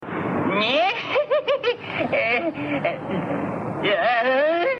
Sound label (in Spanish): risa con miedo; curly